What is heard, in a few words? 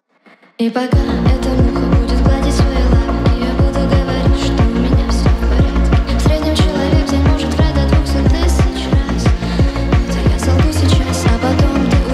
hardstyle remix